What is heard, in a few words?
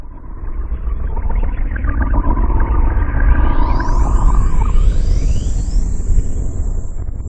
alarm; Masterbits - Tekkno Trax; MACHINES+FX